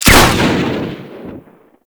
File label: Carrier Sent Shotgun Prime Fire, weapons; DE; Warframe; Sentinel